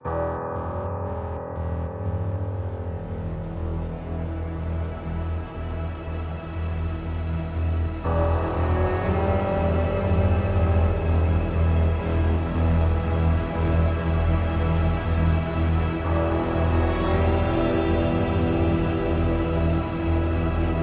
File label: Aftermath Ambient